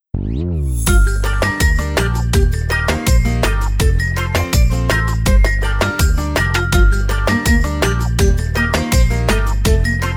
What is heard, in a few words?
KARAOKE